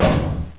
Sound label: metal, player; cstrike